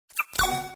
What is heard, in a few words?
Widget Entry; DailyBonus